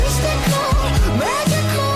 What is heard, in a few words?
mystical magical